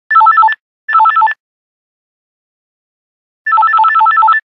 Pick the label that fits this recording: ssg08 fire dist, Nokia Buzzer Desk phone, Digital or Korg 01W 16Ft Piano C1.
Nokia Buzzer Desk phone